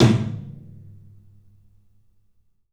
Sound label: TOM, 3D Drums, CLUB TOM; 3D Sound; CLUB 12 TOM